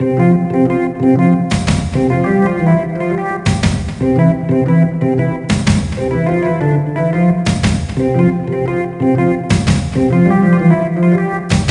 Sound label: Ditty; A Building Full of Cats